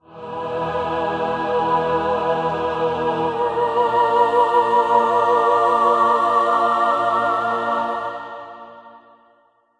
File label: Big Win; Stronghold